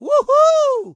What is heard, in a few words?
gnome cheer